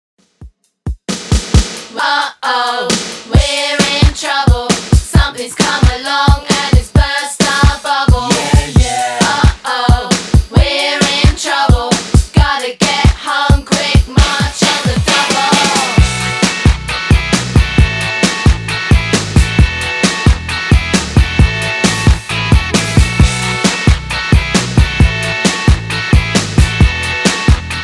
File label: 90s Redrum 133bpm